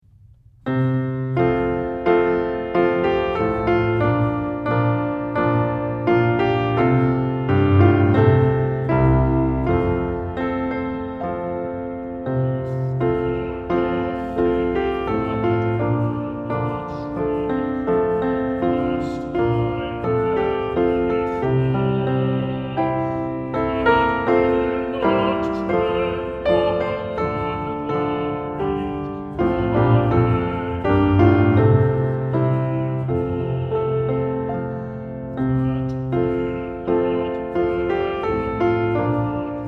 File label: Piano; Oh For A Faith That Will Not Shrink; piano accompaniment pieces